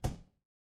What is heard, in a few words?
impact punch